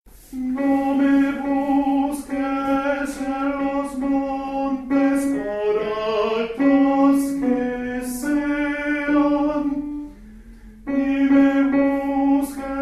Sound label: Tenors; ESTA TIERRA